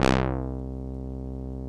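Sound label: Juno brass, analog synths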